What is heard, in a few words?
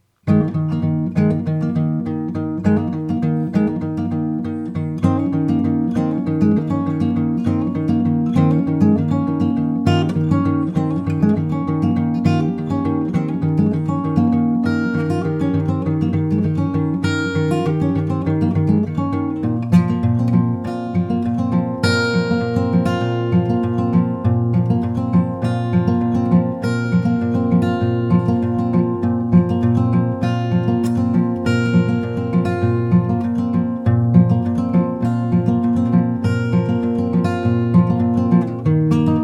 cello song fragment